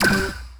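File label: skate race checkpoint; Tony Hawk's Project 8; XBOX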